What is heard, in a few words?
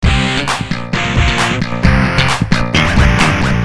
depressing rock music